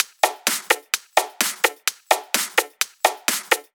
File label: Loop, 128 BPM